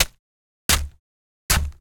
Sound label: Slice Crunchy, Gore; Soundopolis; Gore Toolkit HD